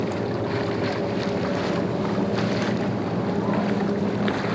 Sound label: ship idle super battleship; Hearts of Iron IV Death or Dishonor